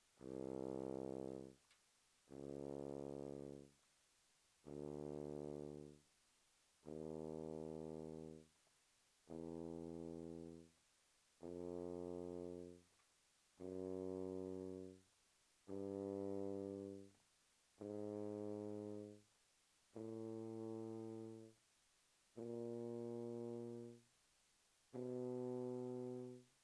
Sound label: Tuba mf C2 B2